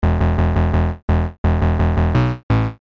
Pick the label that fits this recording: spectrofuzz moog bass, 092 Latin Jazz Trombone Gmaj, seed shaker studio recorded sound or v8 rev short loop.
spectrofuzz moog bass